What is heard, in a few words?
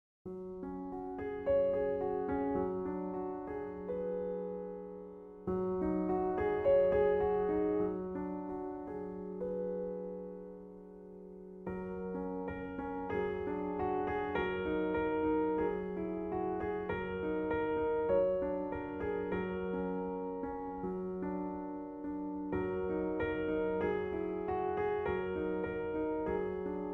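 Reverie Garritan CFX Yamaha, piano; Lyric Solos for the Church Pianist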